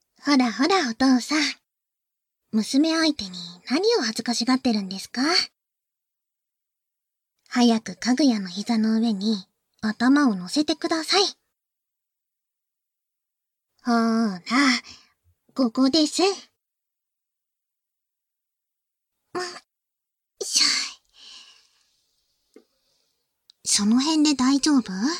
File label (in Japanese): 耳かき右; 簡易モノラル編集 効果音あり